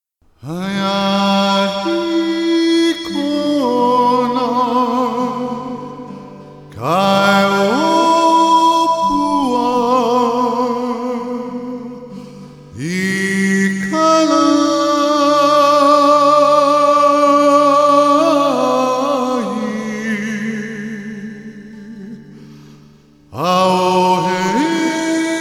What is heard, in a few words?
acapella